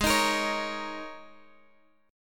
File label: G sharp Suspended 2nd Suspended 4th G sharp Guitar Open F; strummed; 20,22,22,20,x,x; G#sus2sus4